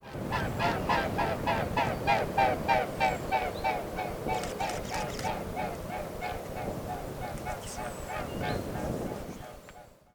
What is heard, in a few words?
Mute swan